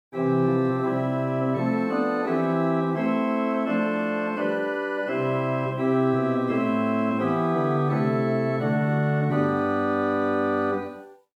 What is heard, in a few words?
Sw Rohrflute Gemshorn Nazard Tierce, organstops; First Baptist Riverside